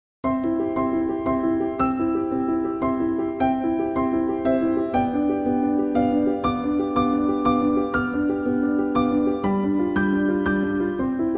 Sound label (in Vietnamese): luyen ngon piano czerny op 599 bai 31; giao trinh